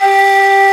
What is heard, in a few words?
Nye Flute G3; Multisamples; Digital Sound Factory Planet Earth